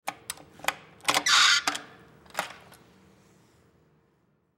Jail Door Maximum, Jail Door Maximum Security Open; Prison Door; Emergency